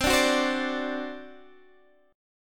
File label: C Suspended 2nd Flat 5th C down Guitar Open D